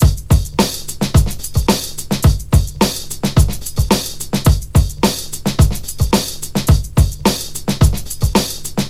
108 bpm breakbeat f sharp key, kick tuned to the F# note. Loudest frequency: 1832Hz; drum loop